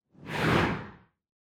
Camera Motion; カメラモーション; シーン・カメラモーション

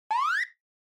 Warning Siren High Single

